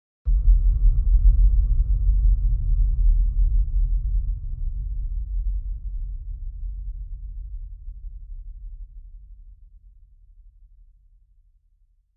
LFE Electronic Pulse with Heavy LFE, Phase LFE Double Ele; LFE Double Electronic Thump with LFE